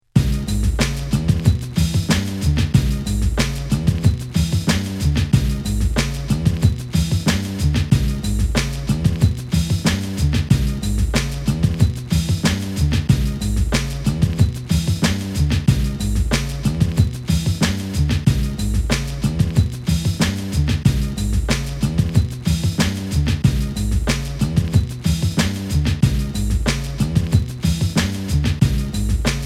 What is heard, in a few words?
beat, bpm 103; wicked mix vol 016